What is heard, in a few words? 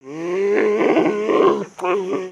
Pets Bear Panda Angry; creatures; Lego